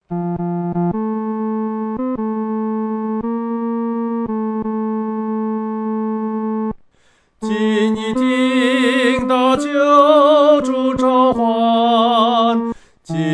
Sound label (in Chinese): 独唱 第三声